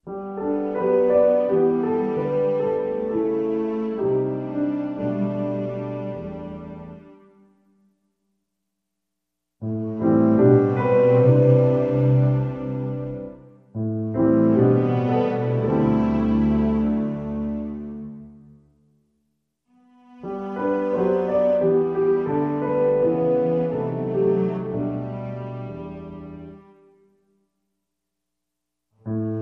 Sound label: Underscore With Strings